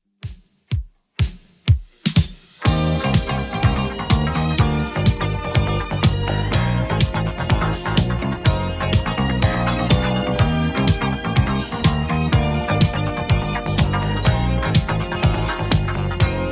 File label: 80s Redrum 124bpm